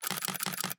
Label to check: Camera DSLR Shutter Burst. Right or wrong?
Right